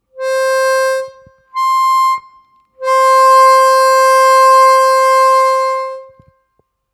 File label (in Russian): +4+7, октавы, губной гармошке; блокировки языком